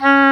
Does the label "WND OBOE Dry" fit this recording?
Yes